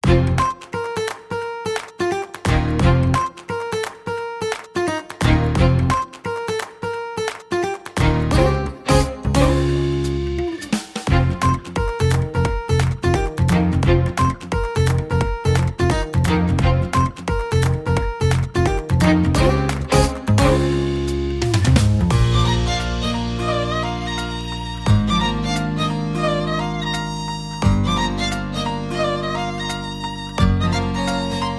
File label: character story lively